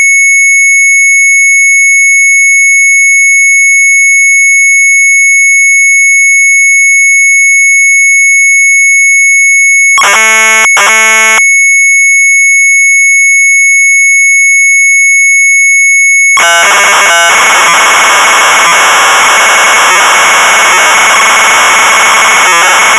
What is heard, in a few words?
MZ800 Tape, sharp; SIMON; retromelsarchive